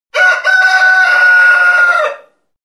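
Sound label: petuh, rooster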